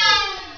slide

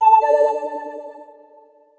notify

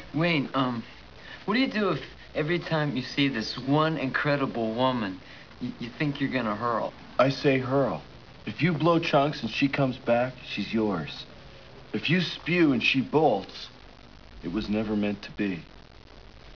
HURL

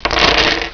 DROP

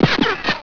RICOCH

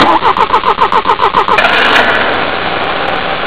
IGNITION

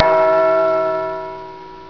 CHIME

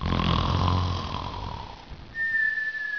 SNORE

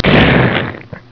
SPLAT

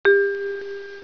CH COUNT, CHIMES; Windows

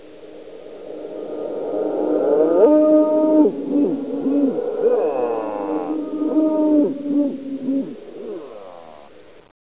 moan spir

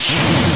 GOT FLAG

